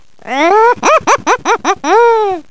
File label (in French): sorciere; halloween